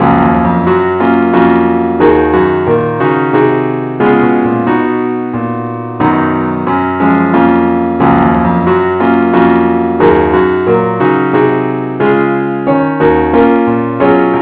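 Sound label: keyboard only key G flat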